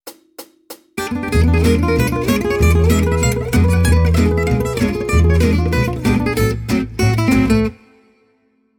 Jazz, Guitar

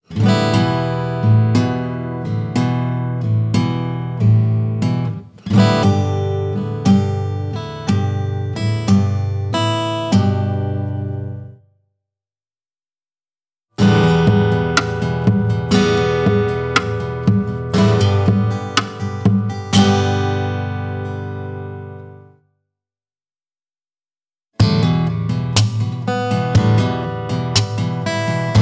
Acoustic Strum, Tap, Slap; Guitar Techniques 2018 Issue 289 December

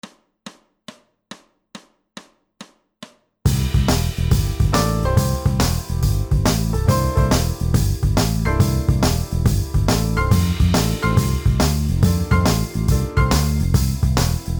Blues backing; Guitar Techniques 2017 Issue 272 August

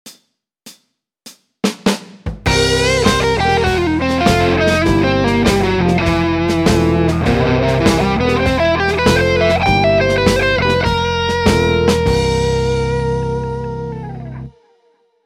One Minute Lick slow, Guitar; Guitar Techniques 2019 Issue 297 July

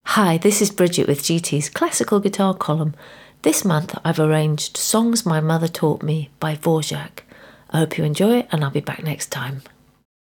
Classical intro; Guitar Techniques 2019 Issue 294 Spring

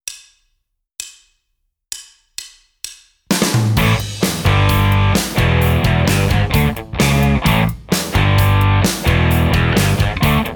Rhythm Guitar

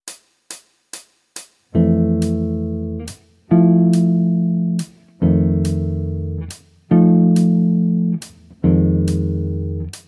Jazz; Guitar Techniques 2019 Issue 296 June

